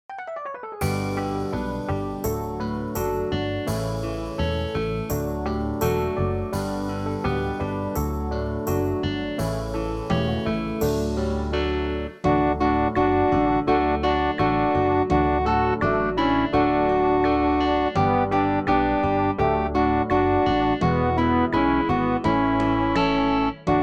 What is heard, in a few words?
instrumental; New Hope Music